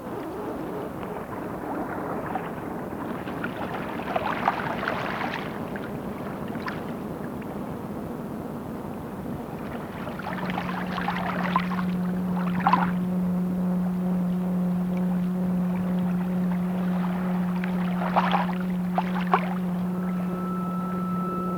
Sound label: boat